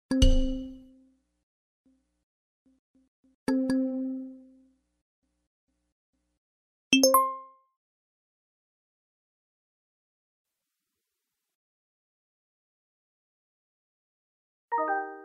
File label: notify; Оповещения